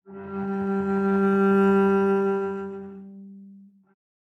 Cello Octave Flutters; SoundsCrate

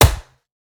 Gun Shot; SoundsCrate

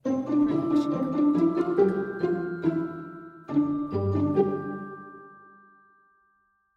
Friendly Ident With Bells; SoundsCrate

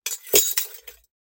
Glass Debris; SoundsCrate

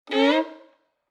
Violin Scary Movie

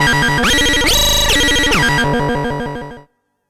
game tone; soundscrate

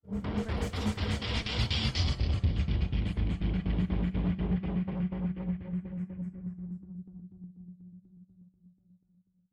Rhythmic Rise; soundscrate

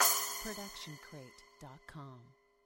splash cymbal 10 inch; soundscrate

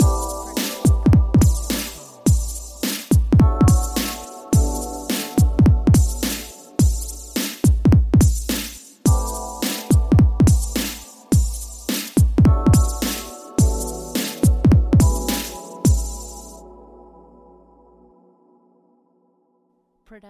groove jingle; soundscrate

